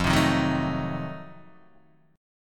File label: F 7th Flat 9th, Guitar Open D